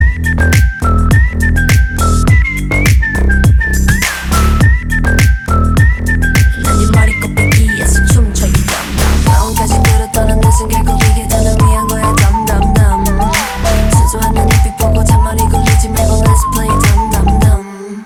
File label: Vocals Instrumental